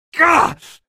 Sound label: pain, male; video games; npc; source engine; episodic; episode 1